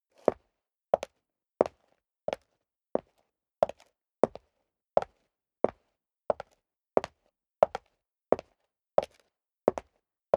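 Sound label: step high heels concrete walk sequence, footsteps; schritte; menschen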